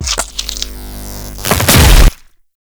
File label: Infested Ancient Melee Mid Spin Flesh